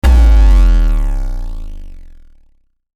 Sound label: Hit Bass Drop Flange; Blastwave